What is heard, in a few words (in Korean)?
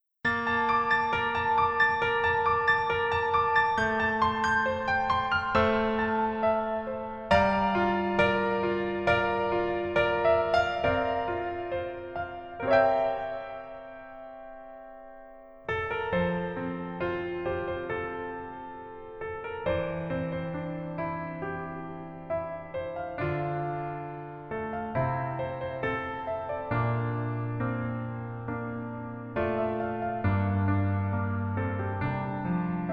Piano ver 멜로디MR